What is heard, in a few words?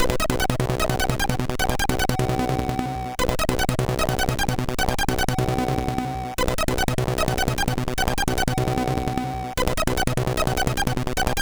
Countrytekh, MOS6581R3; 40 Countrytekh